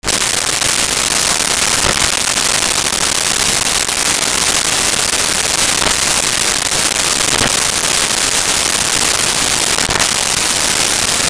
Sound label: plastic crumple